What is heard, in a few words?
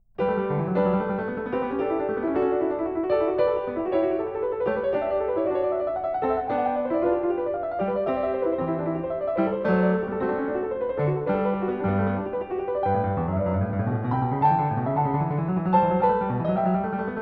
Clav., Binaural; Goldberg Variations, BWV 988 Variation 26 a 2; Bach